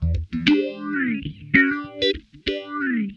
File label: SOFT FAZE, Funk Guitar; 90 s Sample CDs; Zero G